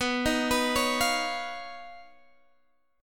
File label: D sharp 7th Suspended 2nd Sharp 5th